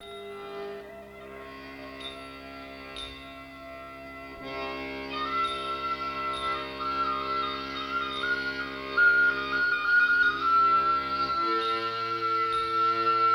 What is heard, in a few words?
instrumental; ritm-n-blues